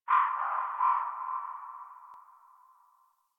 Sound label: Birds Medium, Ambience, Plains; Warframe; Eidolon Plains; Eidolon Landscape; One Offs